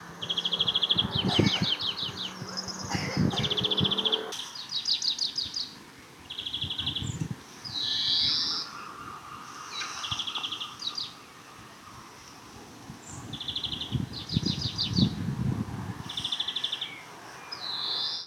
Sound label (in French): verdier